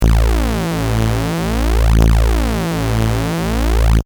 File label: saw times 3 detuned 55 Hz